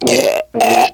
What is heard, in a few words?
choke, survivor voice, biker